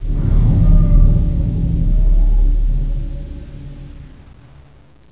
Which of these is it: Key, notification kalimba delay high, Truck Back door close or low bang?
low bang